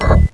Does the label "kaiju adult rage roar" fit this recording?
No